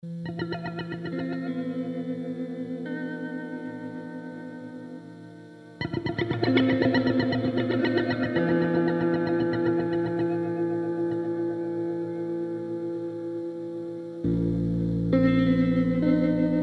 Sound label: Big weights